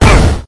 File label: 8bit explosion heavy with voice bomb boom blast cannon retro old school classic cartoon